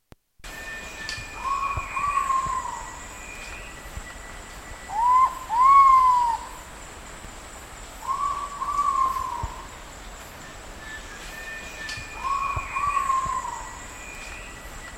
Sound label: pitta maxima; kurtaczek wielki